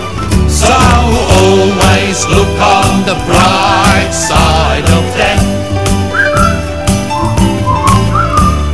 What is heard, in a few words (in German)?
wecker; gimrats